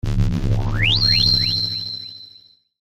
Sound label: UFO Bean Ray, Scifi, Ufo, Bean, Ray, Vintage, Science, Fiction; Spacecraft; BlastWave